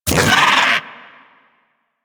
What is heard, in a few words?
Bug Vocal Pain; characters; Warframe; Hunting Critters; Inf K Drive Bug